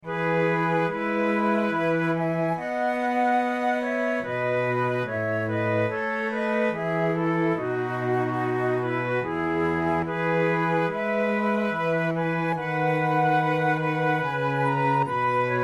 trio sonata sarabanda, flute tunes; corelli

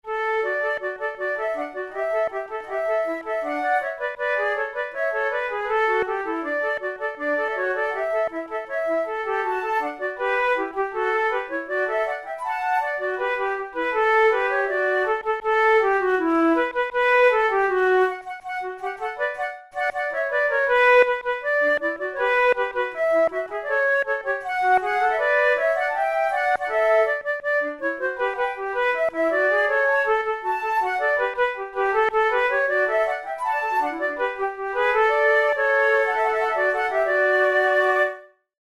duet, flute

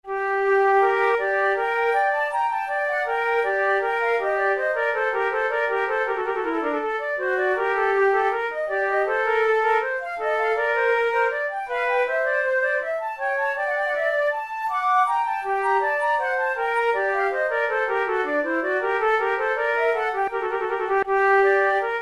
prelude, flute

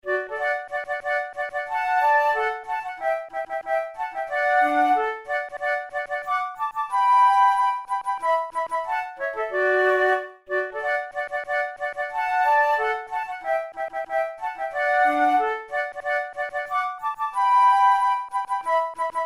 duet, flute; weber

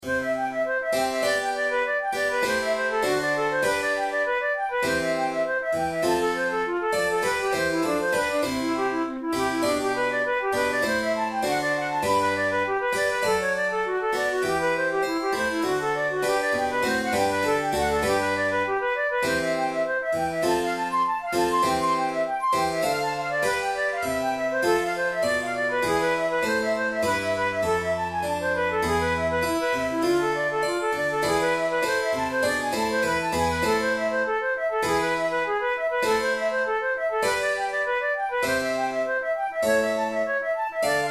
violin sonata allegro; corelli

